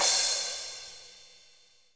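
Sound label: cymbal, drums; Best Service; Real Mega Drums VOL-1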